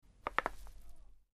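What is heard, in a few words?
cracking fingers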